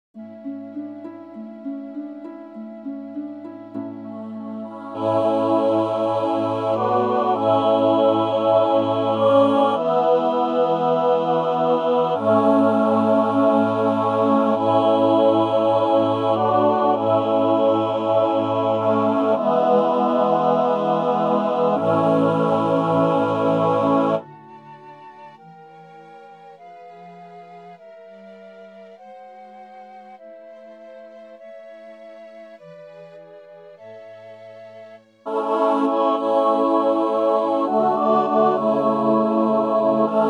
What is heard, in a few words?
My Homeland All